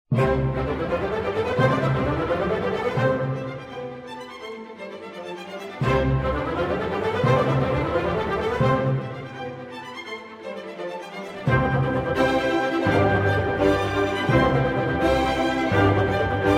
Symphony No. 34 in C Major, K. 338 III. Allegro vivace